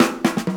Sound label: DRMROLL; E-Lab; X Static Goldmine 2; akai